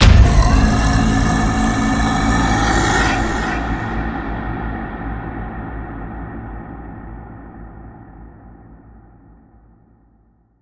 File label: Giant Hit; Godzilla